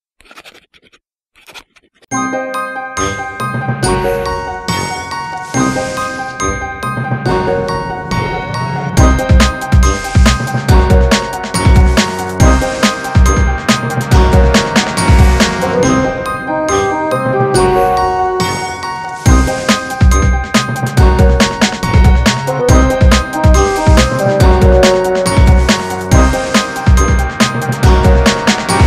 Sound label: Potion Factory Interior; Shrek Smash n' Crash Racing OST